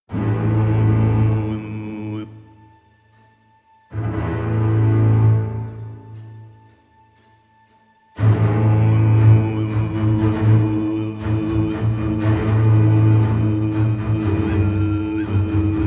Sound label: strings; the horde awakens